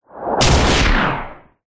sound design hit whoosh lead into a crunch punch and weird tail; zapsplat